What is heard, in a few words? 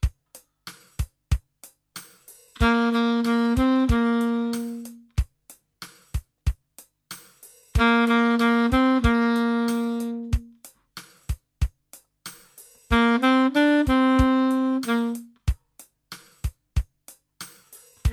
Alto Sax, 85